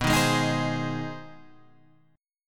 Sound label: G sharp Major B; strummed; JGuitar